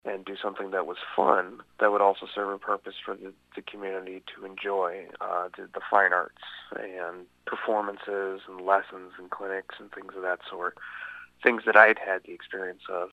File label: brass band; maryville brass band